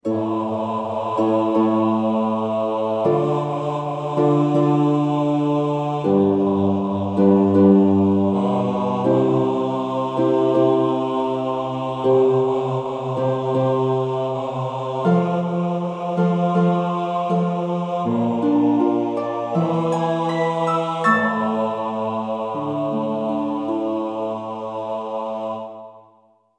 Bass

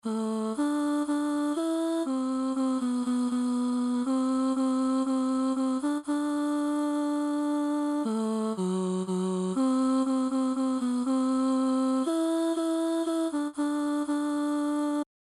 Stand by the Shore verses bottom

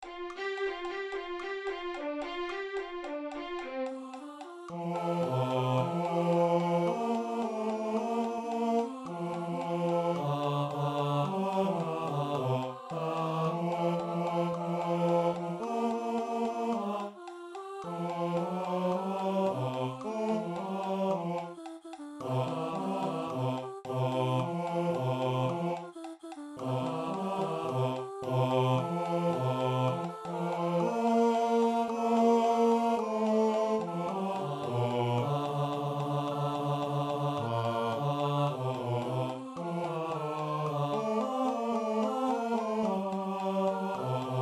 Bass

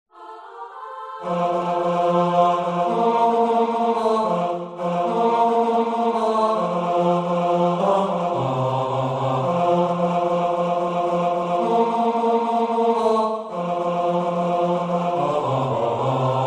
Chorus Bass

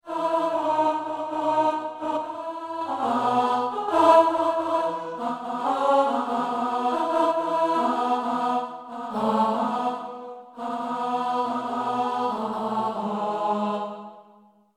Destitution Road Verse Alto

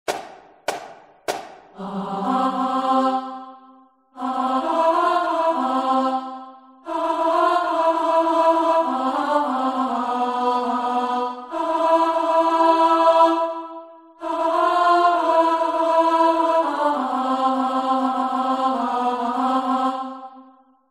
Chorus Harmony

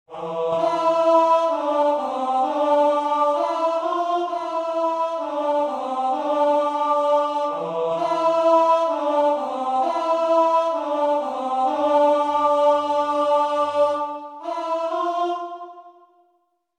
Tenor

